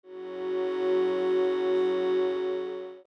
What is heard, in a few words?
drone f sharp; Synthasis